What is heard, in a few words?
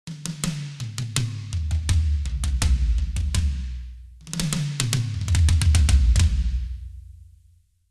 toms; frostbite orckings metal kit; klangmacht